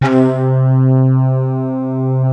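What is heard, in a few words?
FLUTISH BRASS; Greytsounds; Scott Peers Sound Engineering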